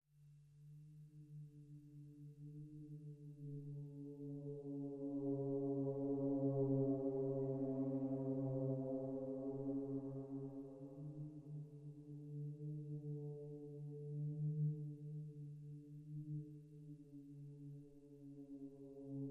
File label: Drones